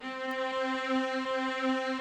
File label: Instrument